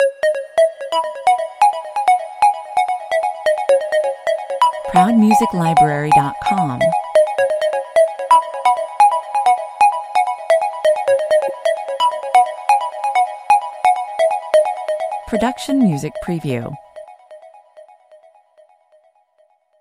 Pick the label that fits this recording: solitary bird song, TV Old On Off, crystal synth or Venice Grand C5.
crystal synth